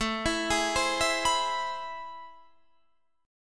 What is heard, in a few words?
A Minor Major 9th, down, Guitar, Half Step Down